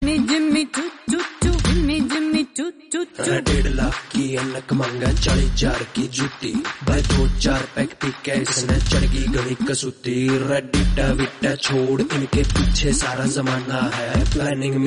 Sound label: punjabi ringtone